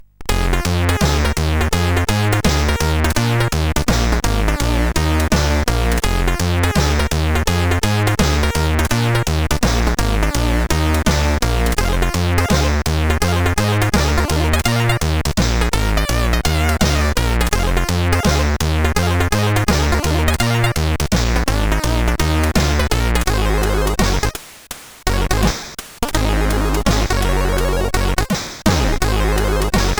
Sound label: Jazz Solo, CSG8580R5